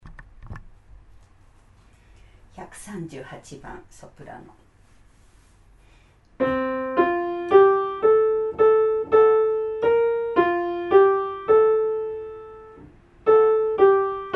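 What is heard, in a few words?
soprano; キリスト集会合唱団・合奏団; 賛美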